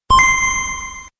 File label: wakeup, Samsung SGH-i600